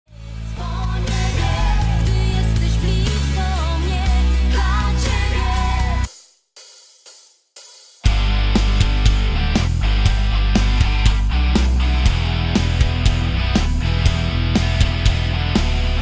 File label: backing, guitar